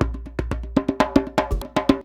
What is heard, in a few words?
119 DJEMBE, Modern Percussion Loops